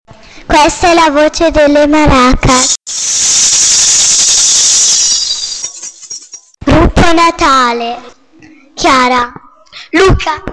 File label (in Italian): maracas